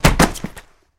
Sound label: Body Falls On Cement